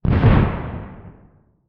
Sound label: Explosion Distant; Fire and Explosions